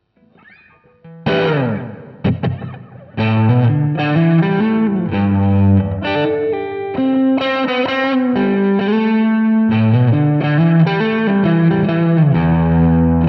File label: dead slow fuzz